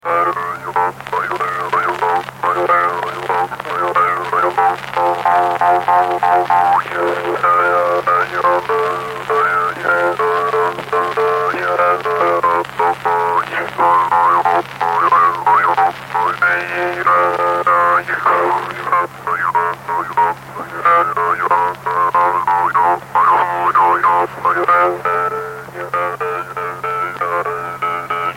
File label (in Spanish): Toque de trutruka; Música Mapuche